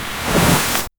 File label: slide on grass